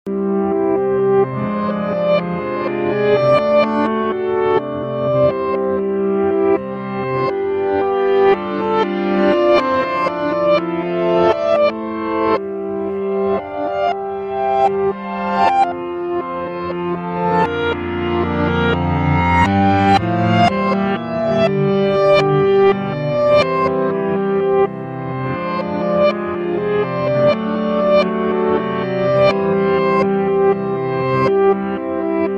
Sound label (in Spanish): Piano